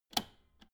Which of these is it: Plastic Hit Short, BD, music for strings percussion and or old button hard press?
old button hard press